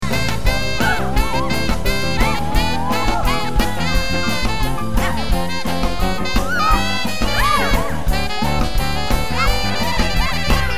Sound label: Bamboo